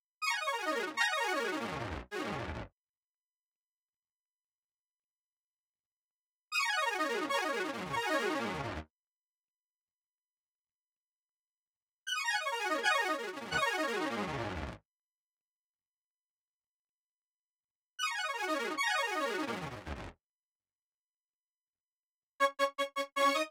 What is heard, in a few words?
synth, Smooth RnB, Ebmin; Big Fish Audio; Legendary; Happiness